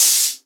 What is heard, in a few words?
Open Hihat; Vengeance Samples; VEE Cymbals